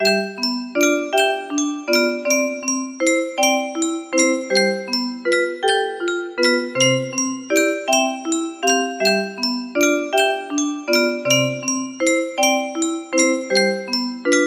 les cloches du monastere the monastery bells, music box; fnaf